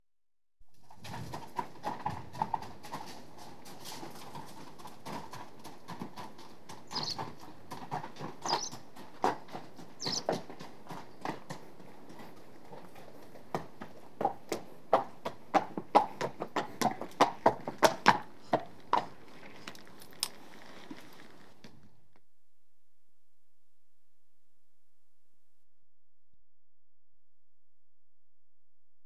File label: One horse at walk approaches; Horses; BBC Sound Effects Library